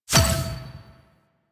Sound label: Placeable Success; Warframe; Ambience; Clan Dojo; Dojo Misc